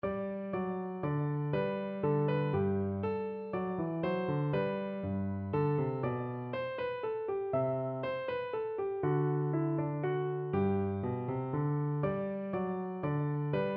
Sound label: bach minuet piano; bwv822